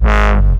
Acid Sounds G#, Synths; Vengeance - Essential Clubsounds Vol.2

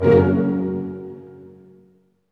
HIT, Tutti Hits, Dynamic Orch; Roland LCDP08 Symphony Orchestra

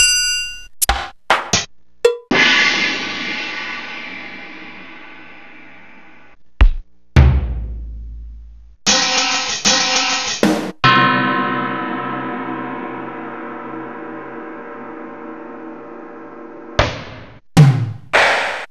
Roland S-550 GT Drums

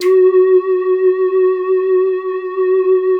LEADS; Masterbits; Vintage Voltage

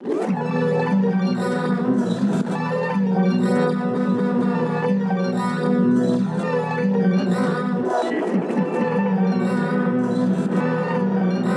GTR primer; Polyend

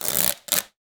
Wicker Basket, household rhythm samples hits

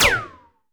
laser brr

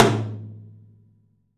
open tom; 3D Sound; 3D Drums; 12 TOM

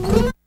GTR STRING, Steel String; Roland LCDP02 Guitar and Bass

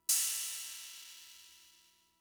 Cymbal; Fricke MFB-522

